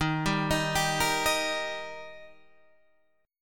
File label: D sharp Major D sharp, chord